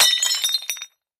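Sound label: Dish Ceramic Plate Crash, housewares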